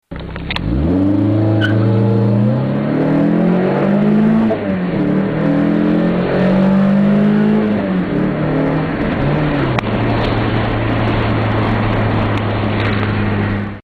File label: 306 16S sound, peugeot